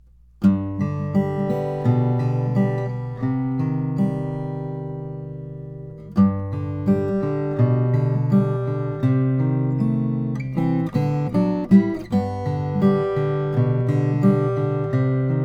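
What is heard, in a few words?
froggy bottom p 12c koa, guitars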